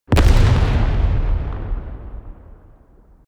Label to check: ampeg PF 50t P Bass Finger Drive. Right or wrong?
Wrong